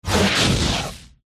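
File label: Fire Spirit buff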